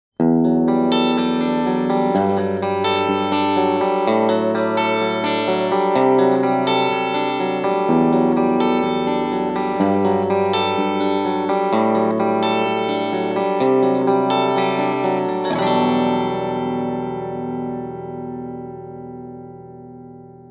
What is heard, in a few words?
Crush 12 Clean